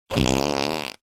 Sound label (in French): Proute, notification